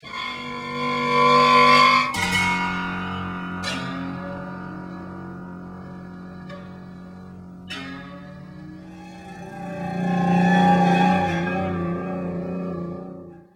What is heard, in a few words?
piano